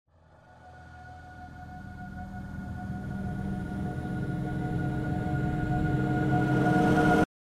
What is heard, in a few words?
Horror Accent Rise, Long